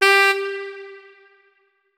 notes, saxophone; Hideout Furniture

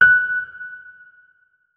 notes, keyboard, electric piano

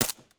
smg9, bolt; weapons; JSRS Sound Mod

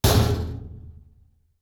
ambient soundscape underground; Soundscape Overhaul

